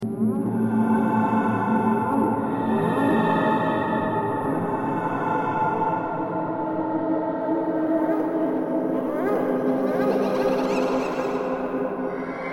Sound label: horrormix